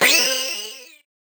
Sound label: VocoBit Noise, 8-bit; musicradar; 8-bit bonanza